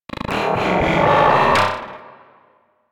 Talking Machines, Ambience; Warframe; Corpus Railjack

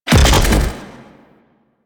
Wall Eye Explosion; Warframe; Sentient Devourer

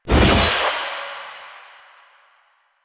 Jade Scythe Whoosh; Weapons; Stalker; Warframe

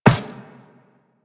Walk Metal Perforated, Footsteps

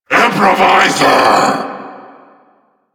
Vocal Investingating; Projected; Enemies; Vocals; Warframe; Duviri; Dax; Vocal Set A

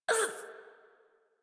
Pain, Adult; Warframe; New War; Adult Operator

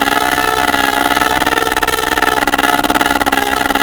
Skiff Loop; Grineer; Vehicles

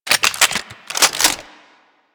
Reload, Weapons; Warframe; Gorgon

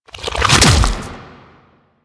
Octopede Limb Attach; Warframe; Man In The Wall

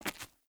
Walk Stone, Footsteps; Player; Mother

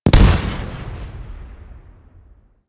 Space Fighter Rager Elite Missile Explosion; Warframe; DE; Vehicles; RailJack; RailJackEnemyShips; Grineer

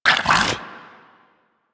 Vomit Gun Frenzy Activate, Weapons, Infested; Warframe

